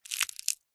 foley dry thick leaf or twig crunch break crush; zapsplat

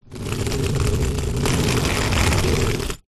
suitcase pull wheels concrete short; foley; zapsplat

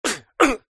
human fight karate vocalisation male

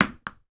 sport tennis ball bounce floor and catch; sound effects one

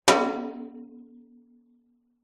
impacts metal drum thin light resonate

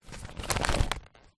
paper crumple movement; foley

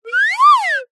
cartoon slide whistle ascend descend

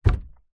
footstep barefoot single wooden step

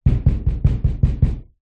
household knocking hard on solid glass door

